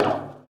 bleacher step; Golden Crayon Sounds

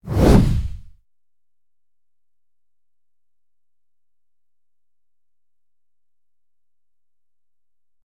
defuse; RS Games Sounds; Exploding Kittens

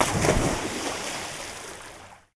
swim fall; Golden Crayon Sounds

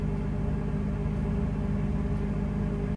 fridge; Golden Crayon Sounds

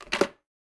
telephone pickup; Golden Crayon Sounds

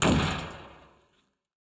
roof land; Golden Crayon Sounds

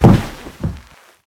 carpet fall; Golden Crayon Sounds

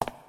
cave grounds step; Golden Crayon Sounds

